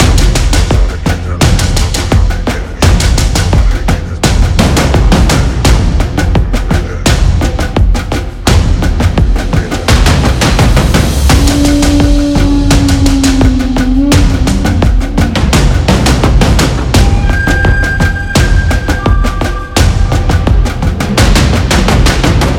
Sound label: before pick loop; gonzos gold